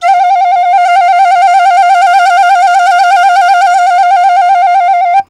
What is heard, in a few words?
Penny 081 bpm B bend, Penny Whistle; African; Quazibeat; African Secret